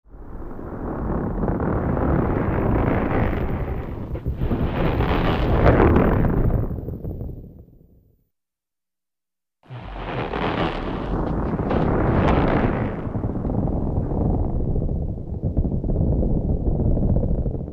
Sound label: Stress Fault Pre Or P, earthquakes